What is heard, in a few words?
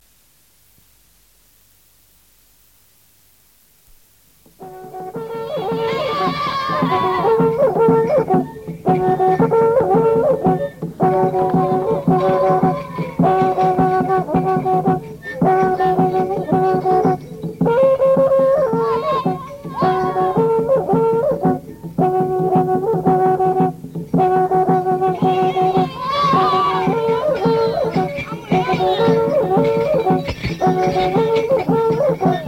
trutruka